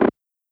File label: Percs, Elektron MachineDrum; SPS1 MKII